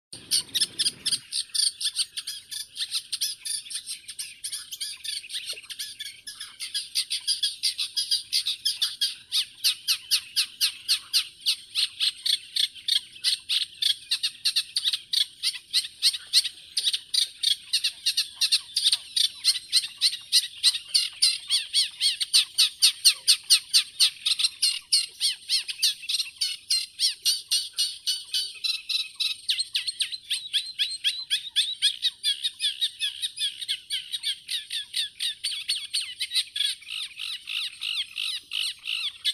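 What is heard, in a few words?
reed warbler, acrocephalus scirpaceus; kamishovka trostnikovaya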